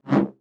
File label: Science Fiction Sci-Fi Saber Light Saber Whoosh Short